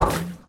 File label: Hit Slime; Lethal Company